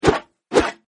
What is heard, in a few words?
attack; casey